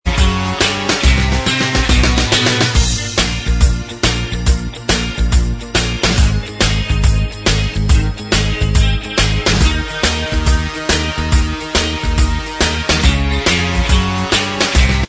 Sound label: Inst